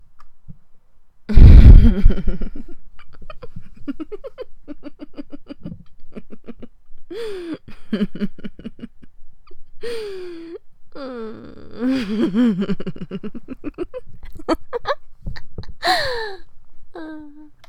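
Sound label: Giggles, female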